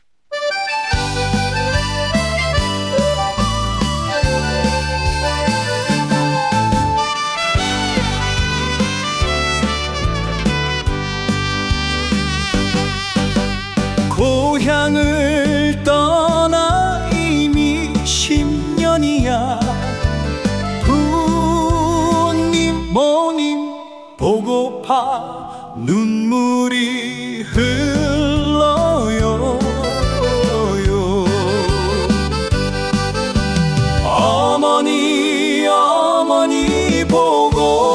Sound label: trot; acestep